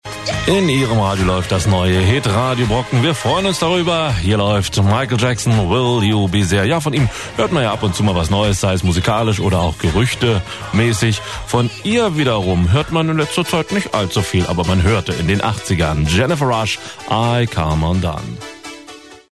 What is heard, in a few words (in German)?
musik